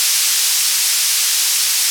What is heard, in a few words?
FX Downlifter